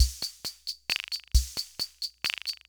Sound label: Loop, sr-95; classic machines